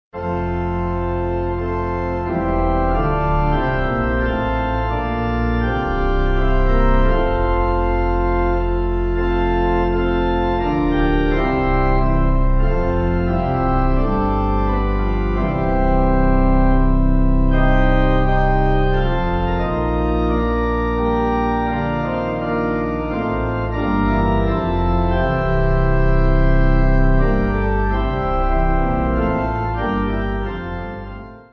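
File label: pipe organ hymn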